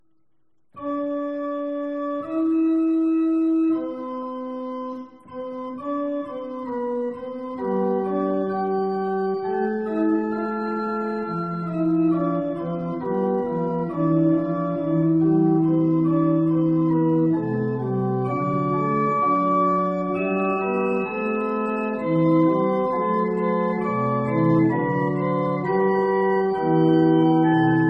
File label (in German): Versette G Truhenorgel; mozart